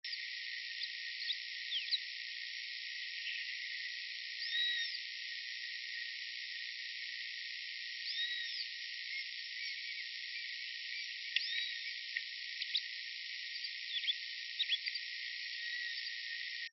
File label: Tawny-headed Swallow; Golondrina Cabeza Rojiza; Stelgydopteryx fucata